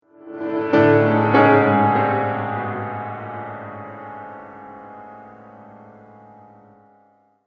Riser Reverse Piano; Rise And Dive Sound Effects

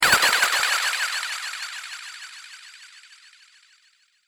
Laser Beam Bubbles, sci-fi laser sound effect; Laser Future - Beam Bubbles

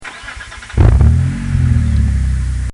Dodge Stealth Starting

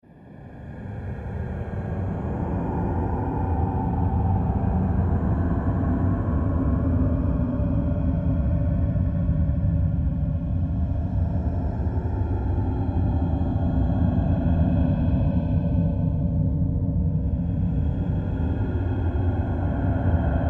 Created Drone Long; sound building blocks created through manipulation of raw material; Drones

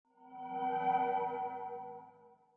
Suspense, Suspenseful eerie high-pitched sounds.; Scary Tones (Sound Effects:Horror)

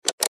Button Switch; Multimedia Web Interactive

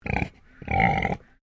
Animal Pig Landrace Grunt

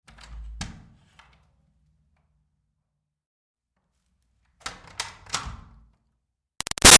Door Wood Church Basement O-C Lite; Miscellaneous Doors; Big Room Sound

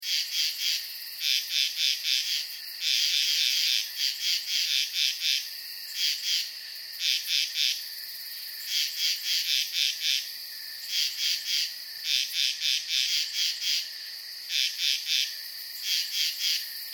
Ambience Night Insects Frogs Loop; Environmental; Nature

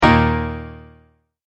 Multimedia Tone-Stop

